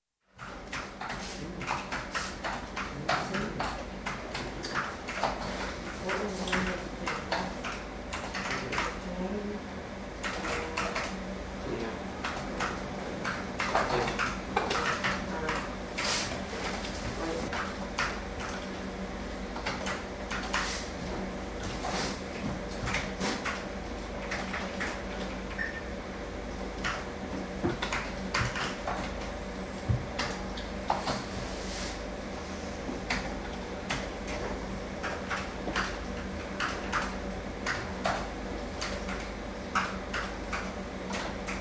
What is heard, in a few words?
School Computer Class, general atmosphere in pre-fabricated room, with hum from computers, keys clicking, occasional background speech; TV whistle from monitors & people moving around; BBC